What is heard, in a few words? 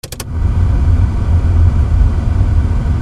CARS